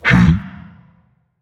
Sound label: notice